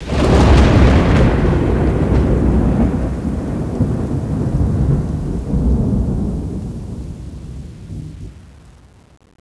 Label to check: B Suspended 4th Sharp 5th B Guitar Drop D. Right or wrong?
Wrong